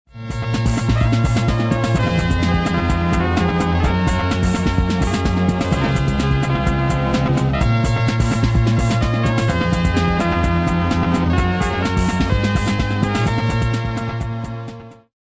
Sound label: start action, music